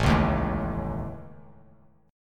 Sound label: A Major Flat 5th, Bass Standard; AMb5; strummed; JGuitar